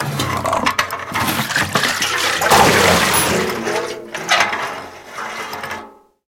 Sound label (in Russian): padenie s grohotom i razlivshayasya voda iz metallicheskogo taza